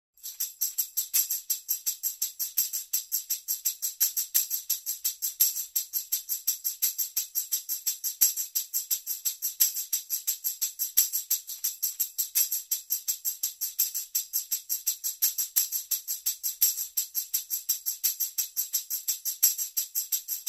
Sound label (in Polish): Tamburyn; Przedszkole nr 1 Tuchola